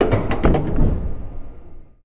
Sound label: gear